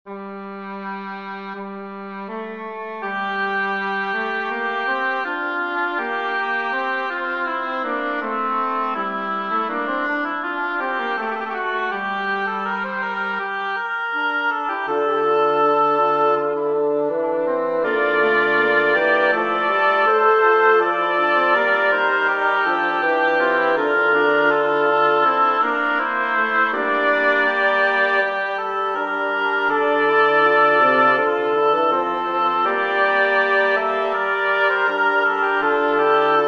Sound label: for double reed quartet; mouton jean